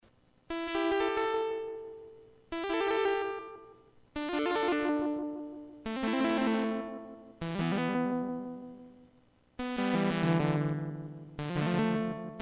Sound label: vibrato; delay